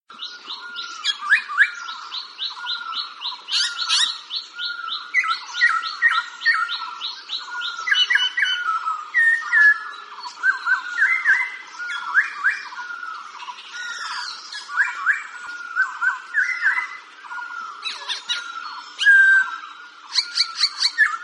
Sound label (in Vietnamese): tieng chim khuou hot hay nhat